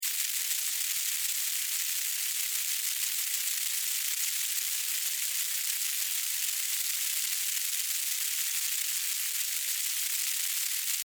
Millipede Walk, Millipede, Walk, Movement, Insect, Bug; Sound Effects:Animals:Insects; Blastwave